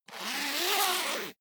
zipper pullover jacket open

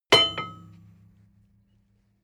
metal tool drop massive; berufe; handwerker; soundpool

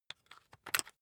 rock drill head attach heavy; bohrer; soundpool

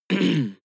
harrumph male single short; soundpool

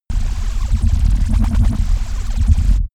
space laser blaster massive damped; krieg-waffen; soundpool

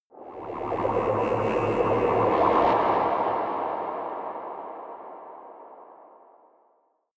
space alien spacecraft underwater huge drive; soundpool

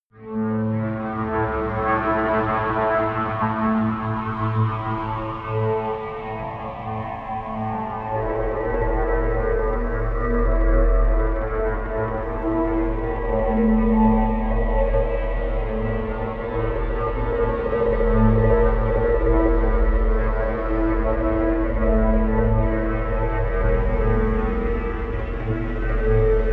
drone light earth tremor

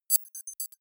computer sound, signale; soundpool

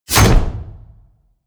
wham, whooshes

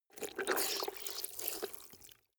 clothes water wring hard

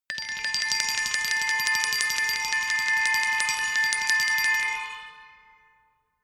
glockenspiel mysterious tremolo low octave; percussion

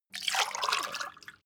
glass pour fast; soundpool

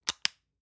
lotion lid close medium; friseur; berufe

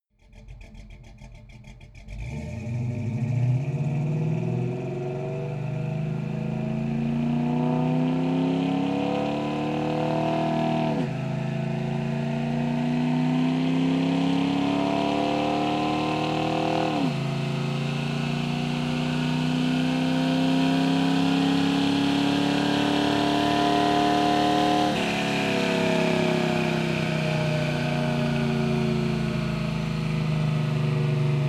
car camaro accelerate decelerate shift exhaust

